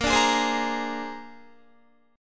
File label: Bb 9th Bb down Guitar 4F,4A,5C,5G,5C,6E; strummed